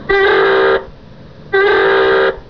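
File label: dive alarm 2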